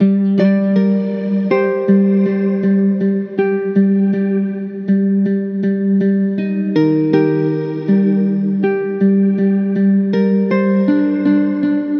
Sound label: Musical Loop, 80, G Minor; Daydream; Ghosthack